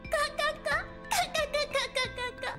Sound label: laughing; Shinobu